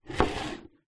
household waste paper basket plastic emtpy slide wood